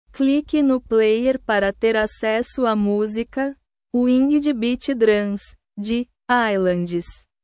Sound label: winged beat drums